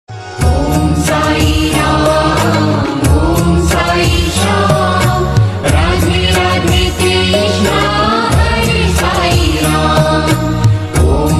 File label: om sai ram ringtone